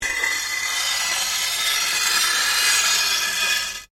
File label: Blade Lock Scrape, Sword